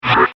Laser Shaver, science fiction sound effect; Laser Sound Sound Effects; star wars / trek style

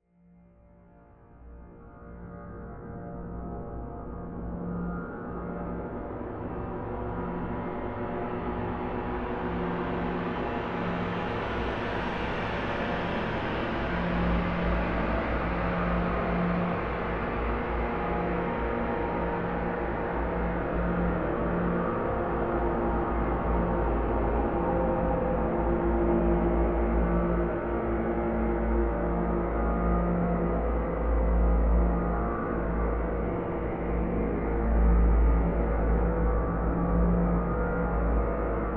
Horror Drone Dark Swirls Swell Crescendo; Scary Tones; Blastwave

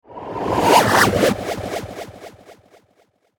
Twisted Theremin; Miscellaneous Cartoon Sound Effects; unusual

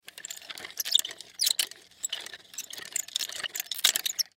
Nunchaku Swing Move Practice; Miscellaneous Combat; Warfare; Blastwave

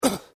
Pain Umph, Male Painful Grunt, Hurt; human sound effects; Lynne Music

